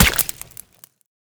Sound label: egg Hit; Crawler broodmother